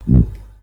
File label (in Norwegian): torsk